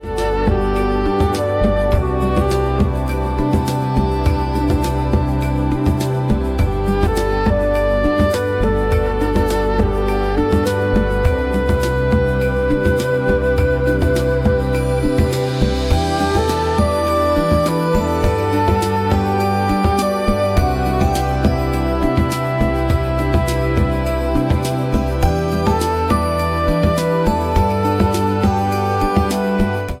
soft leaves, puzzle flow

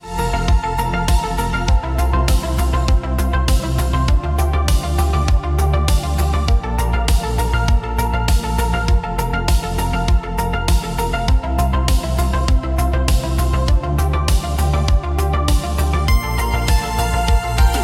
city beat

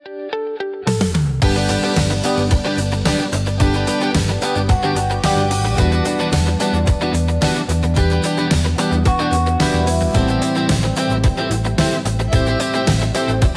evening shopping, business music; business music pack

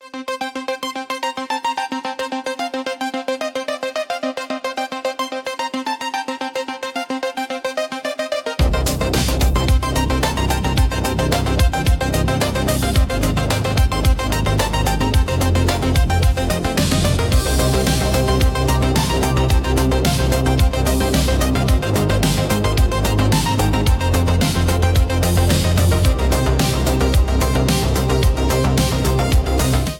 mechanical pulse, music

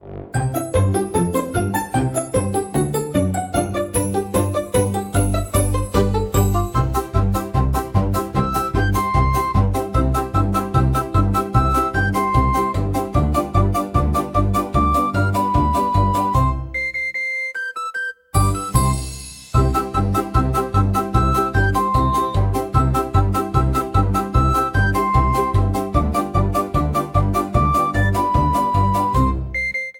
bell chimes; joyland

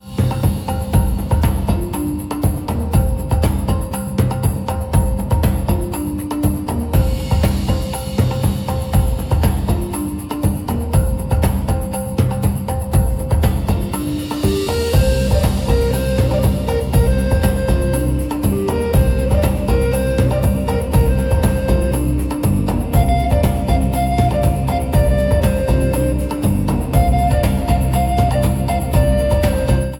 jungle

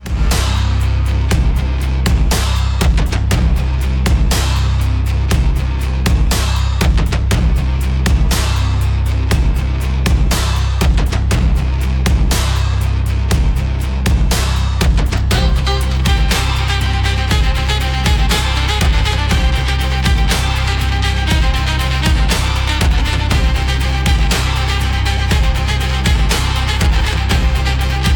rust and silence

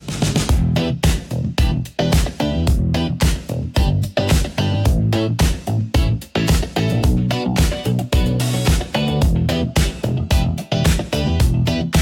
sweet deal, business music; business music pack

